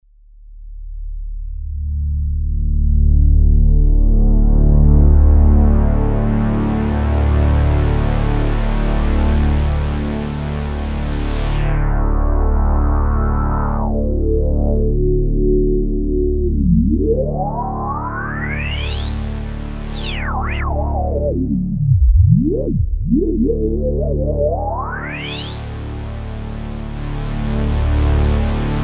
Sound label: Lowpassfilter; KingKorg